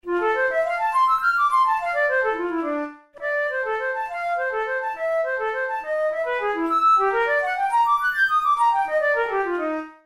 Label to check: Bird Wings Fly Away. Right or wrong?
Wrong